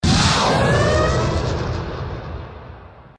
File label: nuke